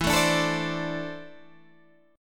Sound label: E 7th Suspended 4th Sharp 5th, Guitar, DADGAD